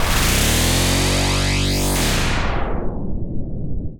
Movement Texture Futuristic; MatiasMacSD; Motion Mode

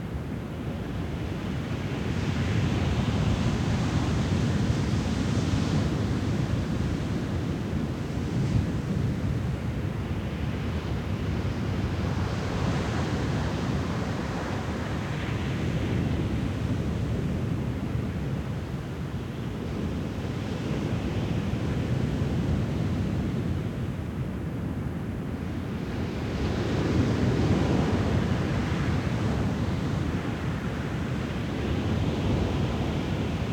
Distant Ocean Waves Loop; The Sound Pack Tree; Sonic Seaside

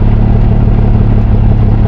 atv idle; hl2

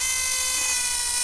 turret loop; hl2; entity

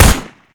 smg fire, weapons; hl2

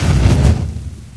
flame, weapons; hl2

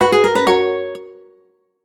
Bell Guitar Gliss Up; The Chris Alan; Gliss Bliss

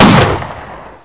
big gun, ambience; hl2

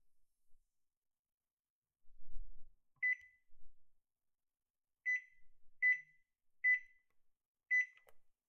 Microwave, Beeps; UberDuo; The Mountain Townhouse Audio Playset

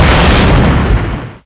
explosions; hl2; impact

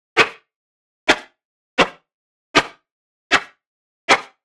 Action Swish; Hits; Mechanical Wave

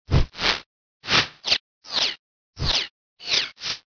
doppler; hl2

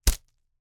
slingshot, dry firing, slap, rubber, rustle, shot; WristRocketPro; BlackMamba bands

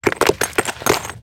Debris Brick; Mechanical Wave; Rock Brick and Dirt

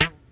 wire; hl2

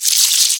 squirm, headcrab poison; hl2

